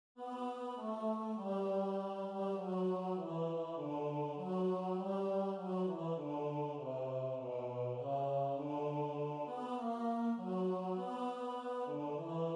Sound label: Tenor